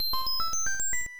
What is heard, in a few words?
Start Jingle